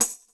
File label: tamb hit